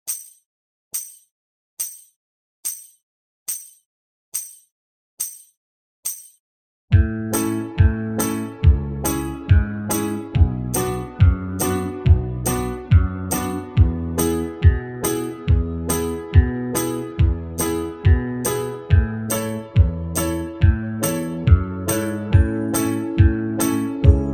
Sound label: key of a woodshed, backing track; banjo